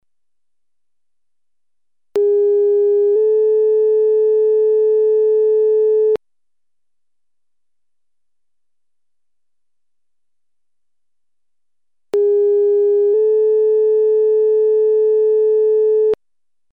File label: Station tones